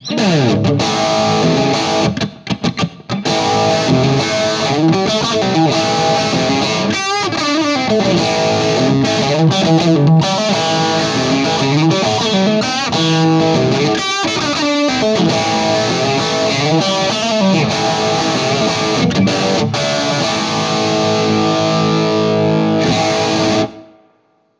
70s Rock Lead; Bax Bangeetar

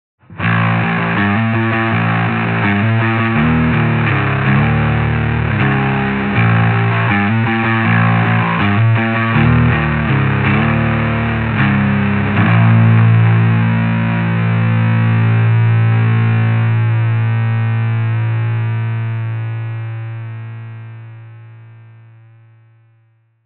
Crush Full Dirt O Bass

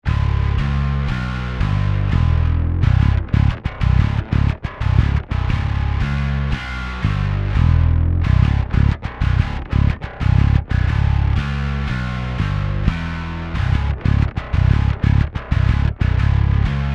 Bass Modern Heavy; Bass Butler

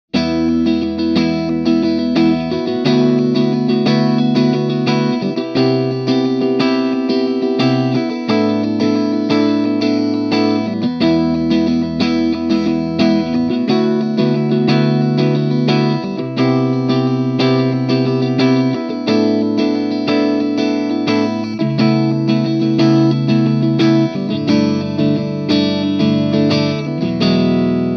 Clean Strat Chords Micd; PPC412

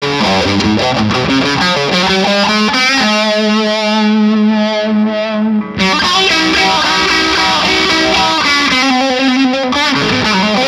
Phaser Medium Dirty Amp Vintage Pedal

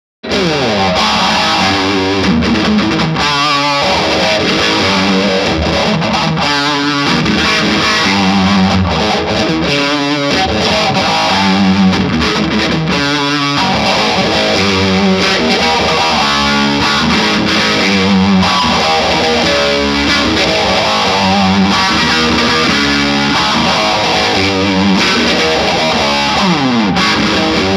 Phaser Slow Dirty Amp Vintage Pedal

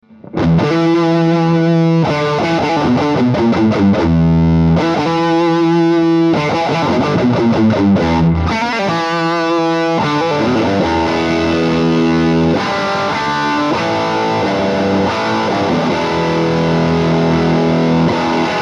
Rocker 15 Dirty; Orange

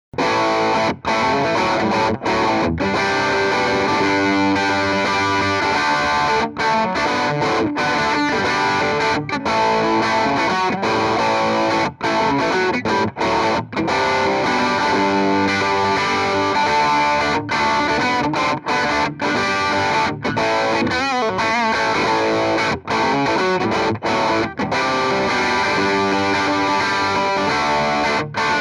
Blues

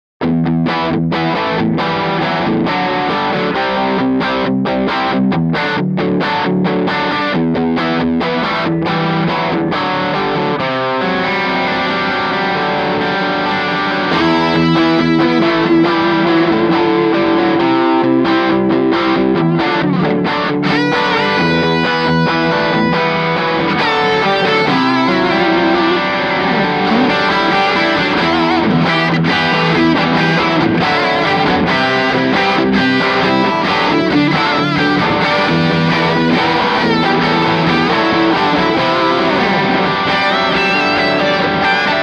Rocker 15 Head Hard Rock Solo

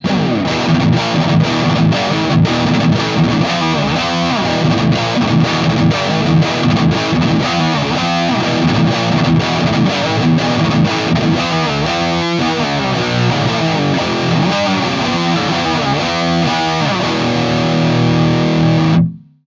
Fast Riff

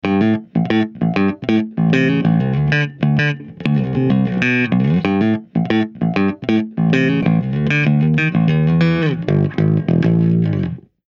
Crush25BX Clean Squire P Bass

